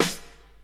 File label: old school hip hop acoustic snare sound g key